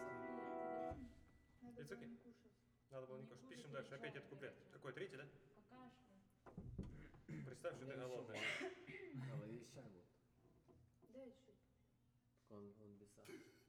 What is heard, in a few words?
piano